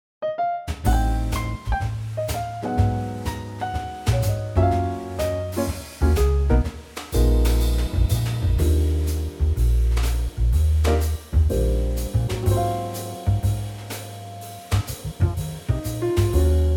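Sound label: E flat trio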